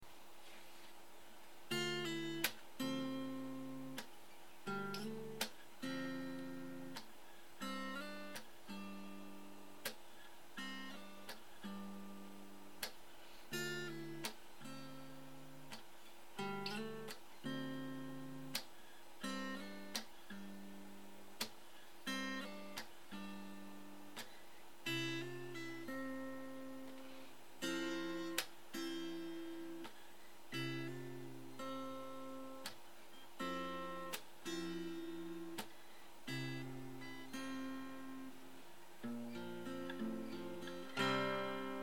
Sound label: idle plucking